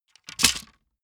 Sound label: wood sticks small break